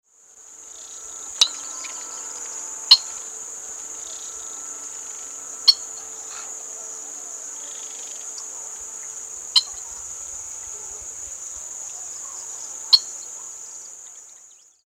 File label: Slaty breasted Rail Gallirallus striatus